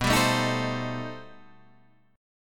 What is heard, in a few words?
B Augmented 7th Flat 9th B; strummed; B+7b9; 1,3,sharp5,b7,b9; JGuitar